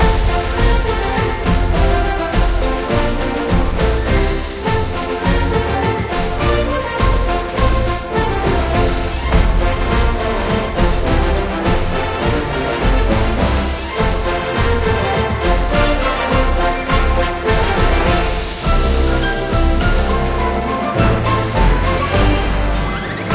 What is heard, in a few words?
playful loop